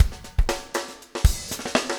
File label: breakout 120, 120bpm loops